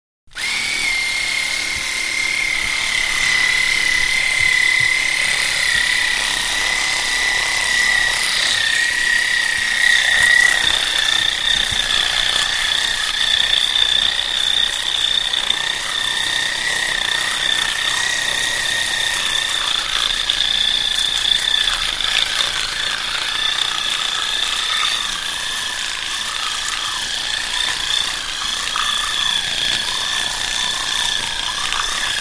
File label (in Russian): chistka zubov; Человек чистит зубы обычной щёткой и электрической